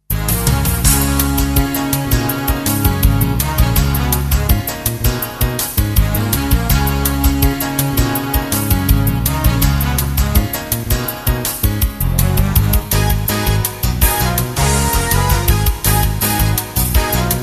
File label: Funk